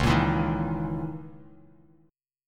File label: Db Minor 6th Add 9th Db down Bass Standard; Dbm6add9 strummed; JGuitar